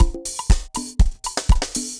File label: dancefloor perc 120 bpm